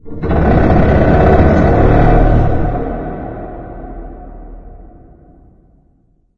warehouse ambience rand; video games; ambient

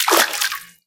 water, footsteps

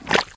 mud footsteps, infected walk

